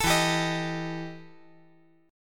E Minor Major 11th E

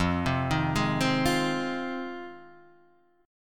Ab 6th F

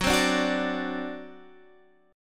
C Suspended 2nd Flat 5th F sharp

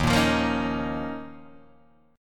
D sharp 7th Suspended 2nd, Guitar, Open D

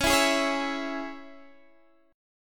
Db Minor, Guitar Open D

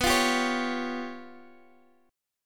Db 7th Flat 5th

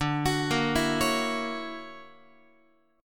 D Augmented Major 9th, Guitar; x,10,9,8,8,12; 4A,4E,5A,5D,5G#,6C#; arpeggiated; JGuitar

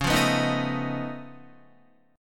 Db Augmented 9th Db down Guitar; strummed; JGuitar

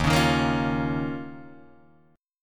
D sharp 7th Suspended 2nd, Guitar, Drop D

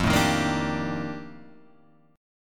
F 13th F, chord

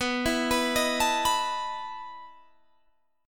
E Major 7th Suspended 4th B

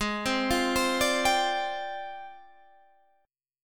F Minor 6th Add 9th G sharp

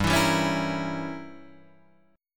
G Diminished 7th, Guitar, Drop D

